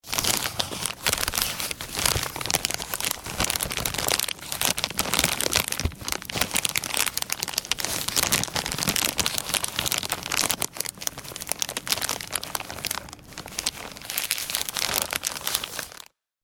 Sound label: Polythene, Crinkly sound of Polythene.; Plastic; Materials